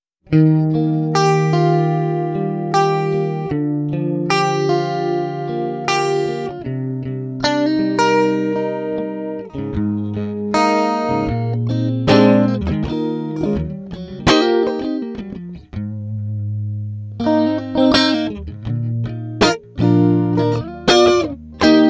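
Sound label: clean mode chorus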